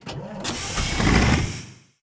furious; World of Tanks Blitz; GUI battle streamed

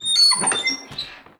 Amb Constructions; Red Orchestra Ostfront 41-45

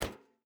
footstep barefoot metal run; Rust

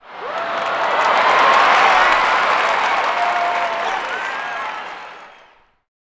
Crowd Cheer; Civ4 warlords

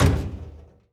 Fairing Door Close, Aircrafts; DCS

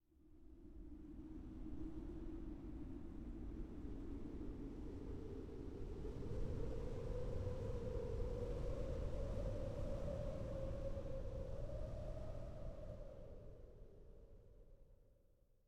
wind gust; Rust

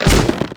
pull flesh

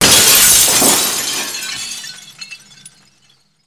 airport skylight glass; Modern Warfare

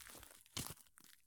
soil turn; Escape from Tarkov

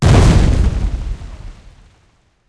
mine explosion; War Thunder

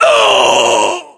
death, voiceovers; english

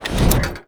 zoom in

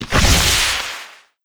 helicopter rocket fire; Rust

